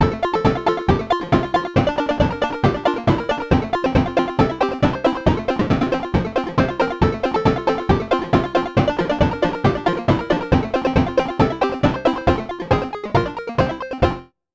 8bit banjo

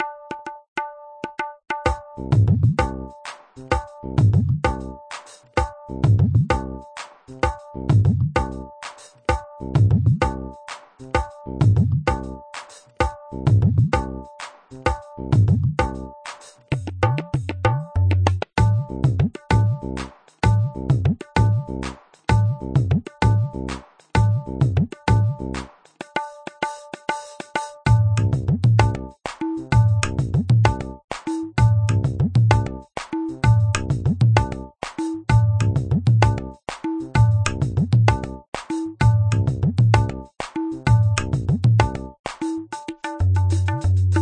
dadra

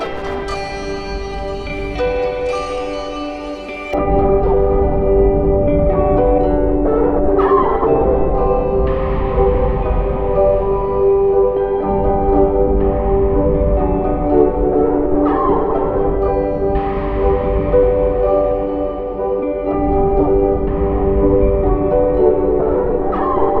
lolcomp filtered on mix bus

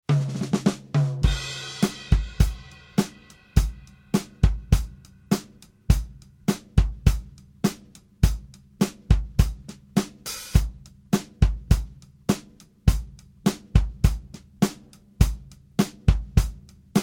103 70s pop rock full

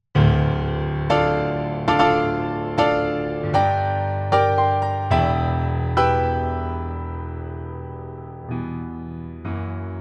pianos; basilica pianos v 1 3

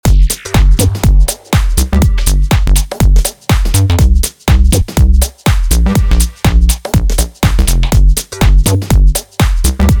bass funky house; bass master

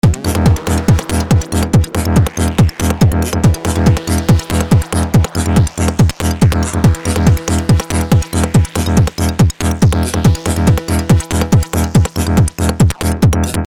techno

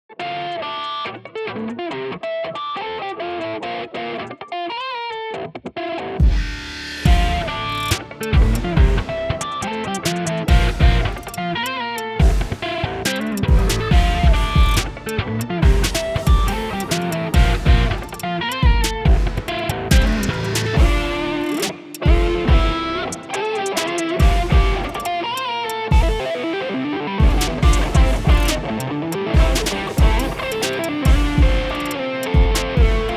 ultra modern tone

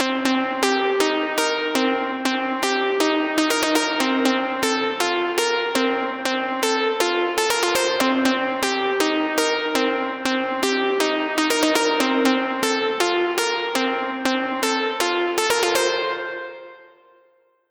reverb

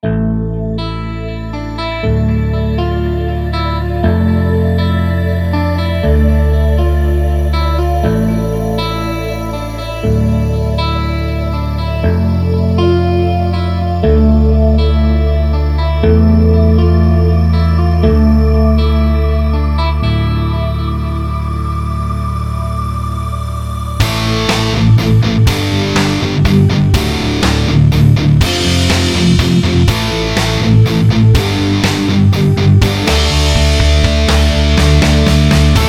thrash di; lost paradise

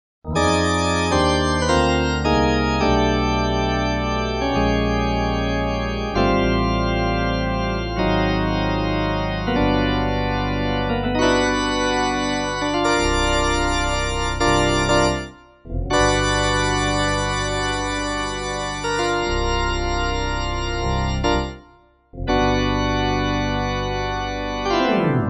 oberheim ob3 organux test

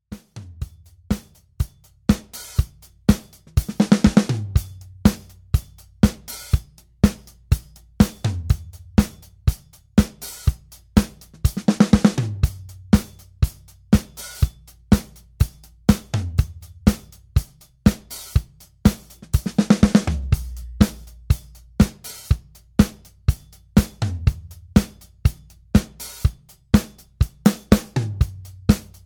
80s pop

